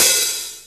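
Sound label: Akai XR10 Hat Open; Drums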